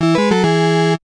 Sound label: draw